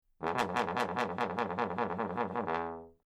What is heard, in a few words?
trombone